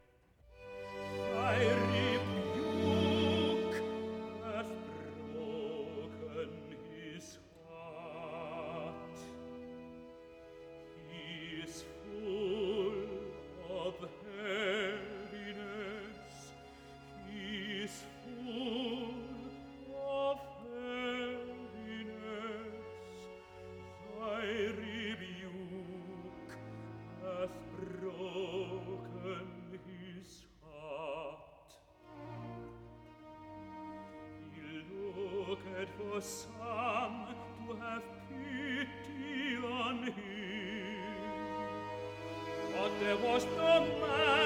Accompagnato (Tenor) "Thy rebuke hath broken His hear; "Thy rebuke hath broken His heart"; Messiah